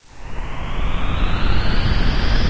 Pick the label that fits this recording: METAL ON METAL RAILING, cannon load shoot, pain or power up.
power up